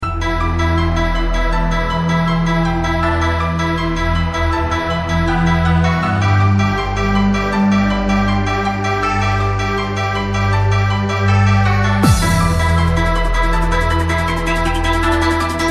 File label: Trance Anthem